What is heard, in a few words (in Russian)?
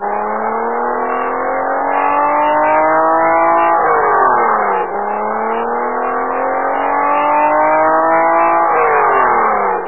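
обгон множества соперников на высоких скоростях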